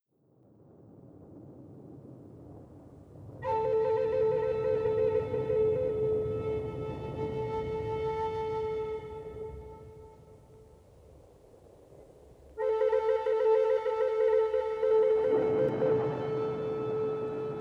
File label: Thunder on a Warm Summer Evening, Native American Flutes & Sounds of Nature